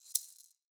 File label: maracas Single Hit Left Hand; Raw Perc One Shots; Slate; SD SEISMIC Drum Sample Pack